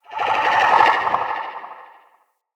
Corrupted Slug Vocal Attack

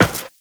Run Metal, footsteps; Warframe; Tenno

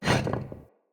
Vase Medium Slide; Vases

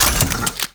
Heavy Grenade Launcher Reload; Warframe; Archwing

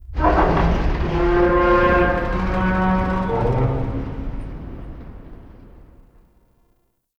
Ambient Tank Vocal; Ambience; Warframe; Nineteen Ninety Nine

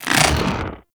Head Pull; Warframe; Teralyst; Ropalolyst; Flight

